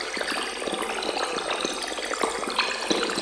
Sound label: Space Scifi Sound - critters many